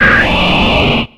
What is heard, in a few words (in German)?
Ruf